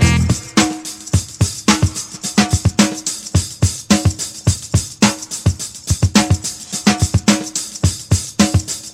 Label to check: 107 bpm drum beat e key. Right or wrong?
Right